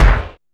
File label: KICKS